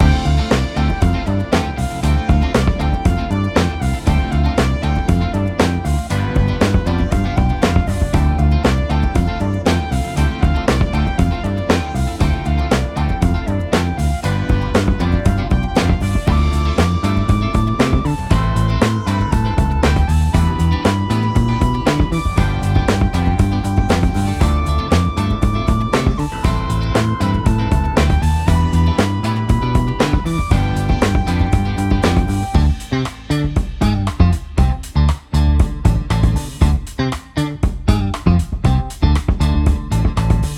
Funk Snare Machine; Funk Music Pack

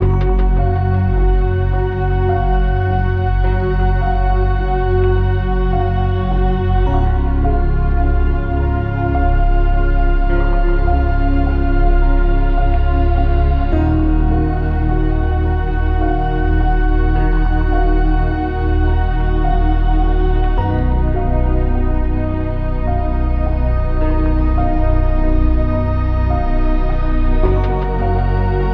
Ambient; Ambient Vol 4 Music Pack

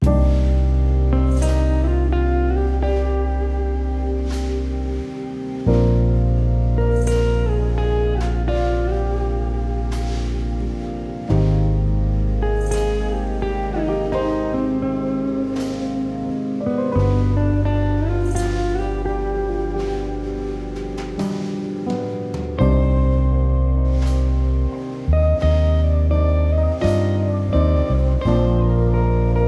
Jazz Dreamstate; Jazz Music Pack Vol. 2

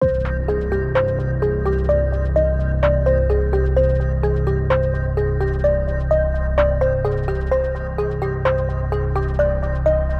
Hip Hop Electro Trappin; Hip Hop Music Pack Vol. 3

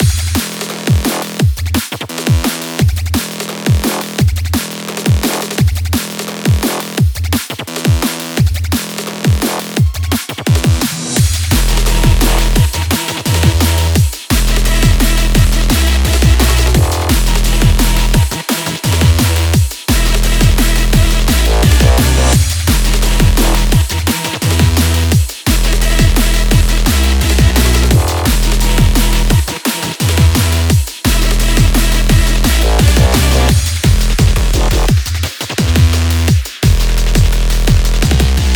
Heavy Electronic

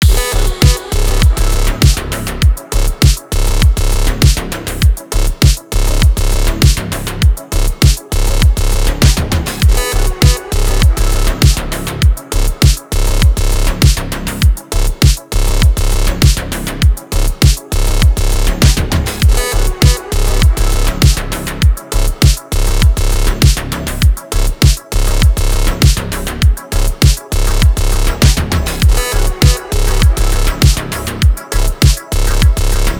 Electronic; Electronic Vol4 Music Pack

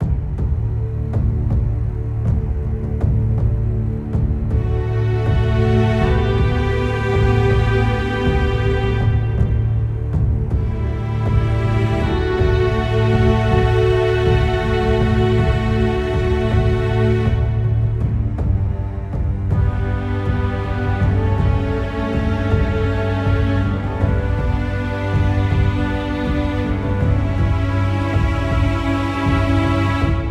Orch Ambient; Orchestral Ambient Music Pack

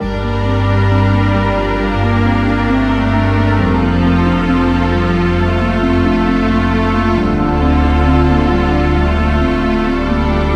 Orchestral Ambient

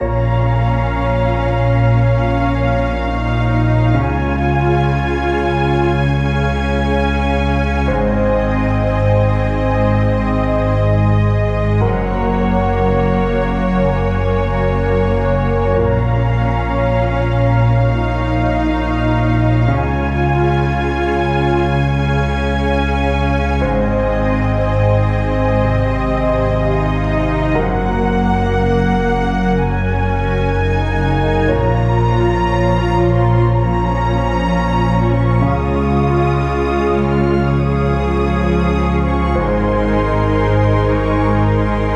Orchestral Ambient Farewell; Orchestral Ambient Music Pack

